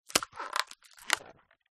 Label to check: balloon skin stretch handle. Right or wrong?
Right